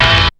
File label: DIST HIT, STAB; SoundFactory; SMPMANIA